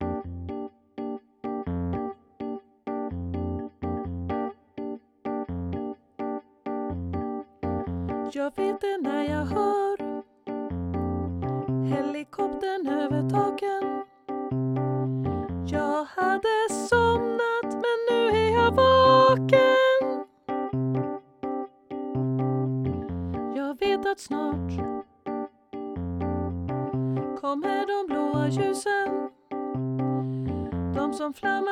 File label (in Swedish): Sopran